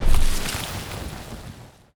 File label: onager hit stone